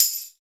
tambourine; Tambourines

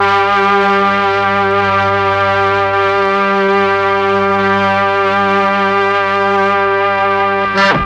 MEL STR, Legendary M400; ILIO; Propeller Island

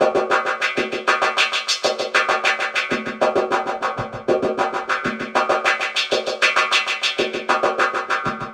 Echo Chuff Down Up, Synthetic Pulses

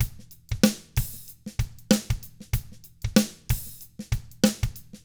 95 funk, drums; ILIO; Double Platinum Drums 1

